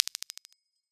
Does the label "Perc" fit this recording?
Yes